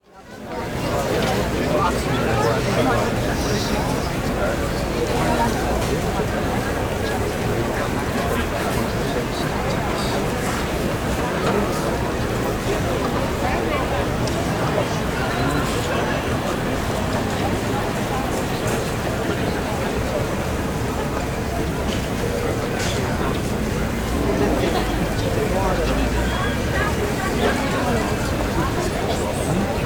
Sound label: Market Outdoor Street, Walla; Sound Ideas; Walla Series